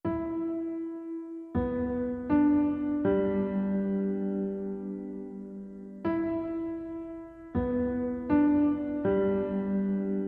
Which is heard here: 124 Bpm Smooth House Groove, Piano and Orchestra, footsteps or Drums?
Piano and Orchestra